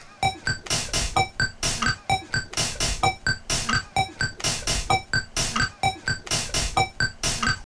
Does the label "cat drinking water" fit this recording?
No